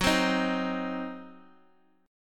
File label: C Diminished Gb